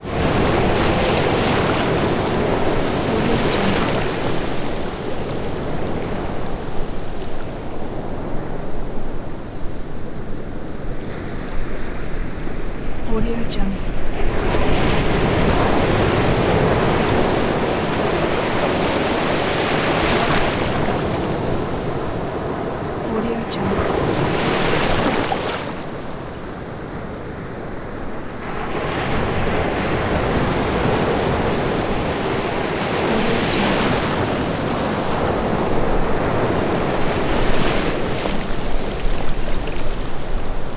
water large ocean break; soundmorph

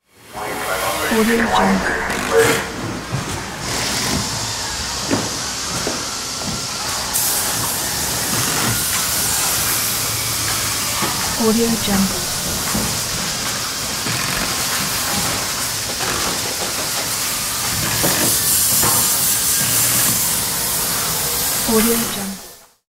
factory manufacture

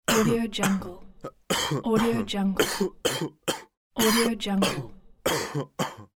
male cough

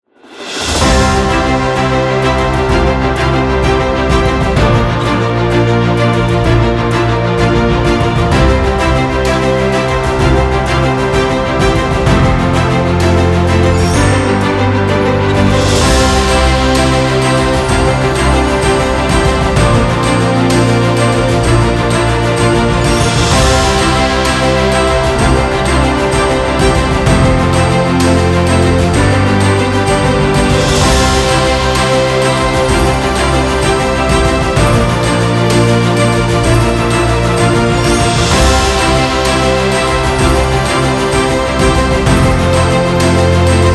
ceremony uplifting background

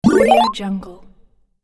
retro game must boost

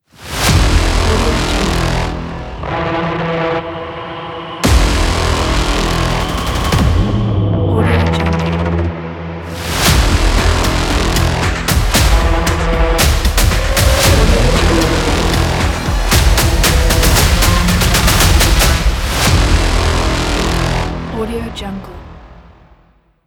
in action trailer logo; soundbay

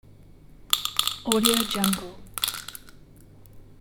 pills poured into box

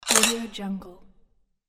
camera shutter click

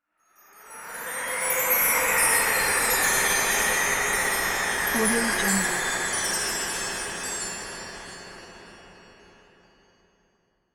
wind christmas

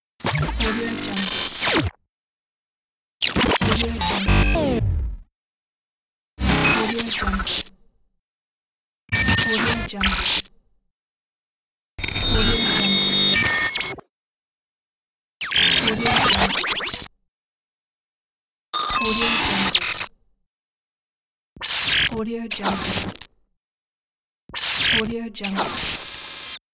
glitch sound effects pack

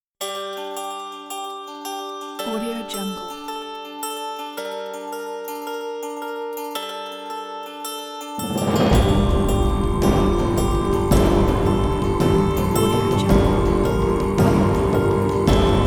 hybrid ethnic trailer

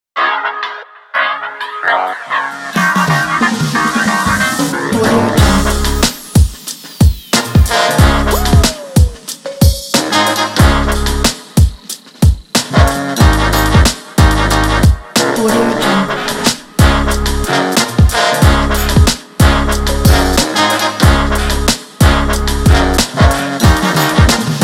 to be in the hip hop

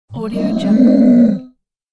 hungry troll munch

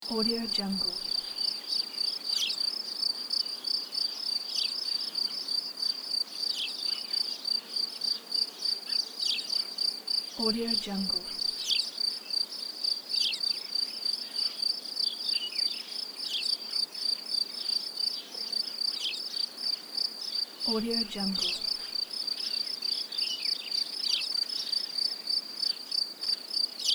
evening in the forest

